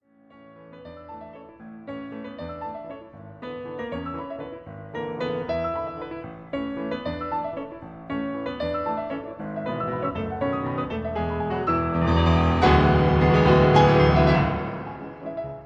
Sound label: Waltz